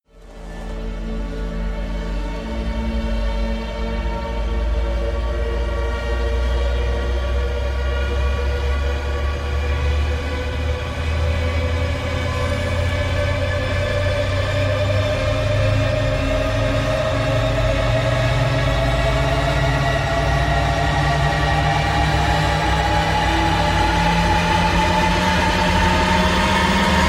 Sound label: rise long; transistor